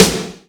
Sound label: GATE SNARE